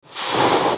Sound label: Cat Hissing